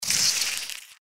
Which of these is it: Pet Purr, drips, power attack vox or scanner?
power attack vox